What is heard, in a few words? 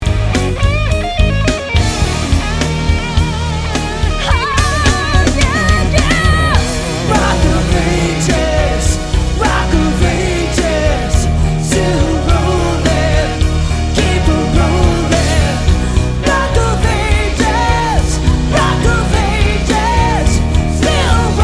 Cover Band